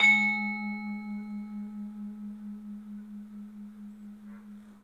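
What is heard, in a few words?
tubular bell; 3D Sound; 3D Keys VOL-1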